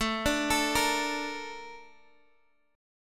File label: A sharp Major 7th